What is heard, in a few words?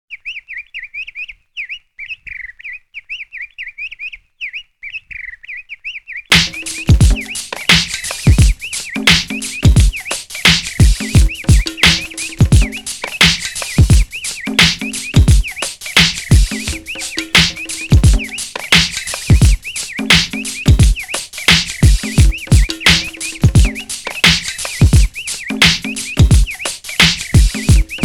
Hip-Hop Jungle